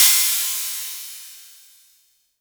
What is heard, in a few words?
Cymbal